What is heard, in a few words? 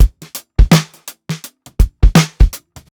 SWUNG 83 BEAT, DRUMS LOOPS; BASEMENT DRUMS VOL 2